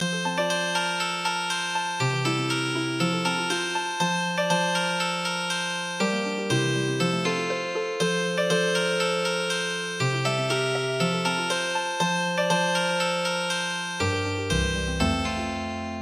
Healing Harp; London Bridge

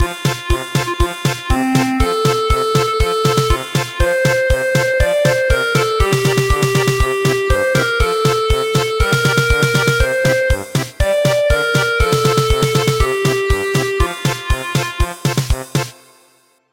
German Polka

